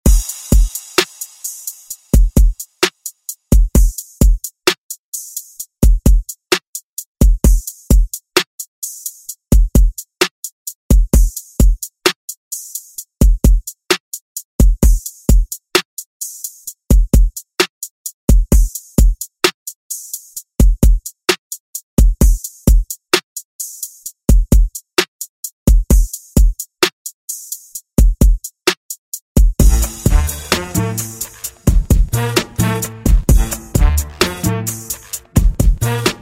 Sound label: ReDrum; DJ Mhark